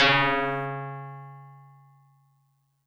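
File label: Tubular Bells DX100 C sharp 0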